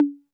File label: 808 MD CONGA; drum